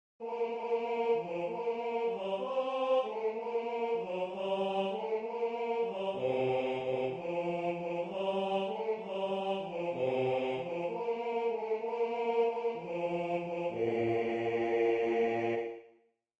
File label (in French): Venez divin Messie Basse